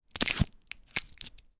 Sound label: food candy bubblegum unwrap